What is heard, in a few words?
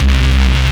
BASS